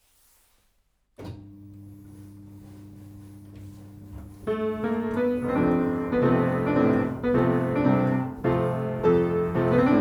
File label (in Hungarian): automatikus zongora; huffeld phonoristz; 1920 nemetorszag; dohnanyi erno keze jateka; verklihangmuzeum